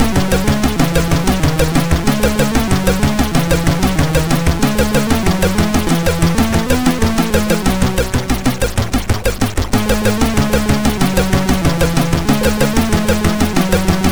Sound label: Noise Part sid MOS6581R4; Noise Part tune 5